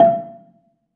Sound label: BELA E4, BELAFON, Asian Traditions; USB Soundscan vol.60; AKAI